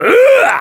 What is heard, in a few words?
kiai; MonsterHunter; Fight